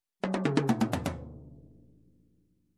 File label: Tom fill